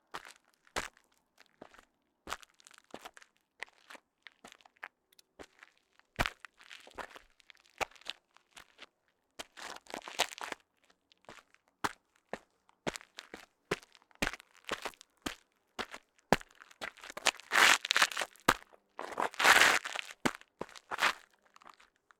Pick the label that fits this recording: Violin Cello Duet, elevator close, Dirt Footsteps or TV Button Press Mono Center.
Dirt Footsteps